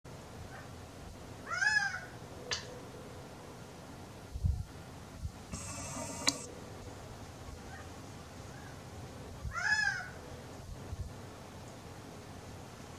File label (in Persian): صدای بلدرچین وحشی